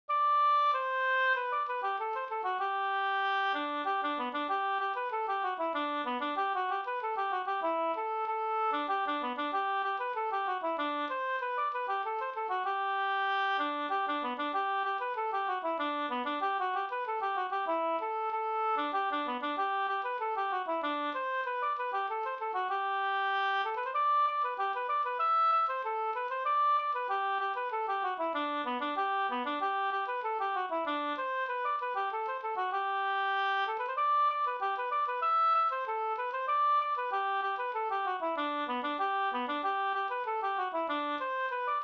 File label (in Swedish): engelska; Stenhuggare-engelska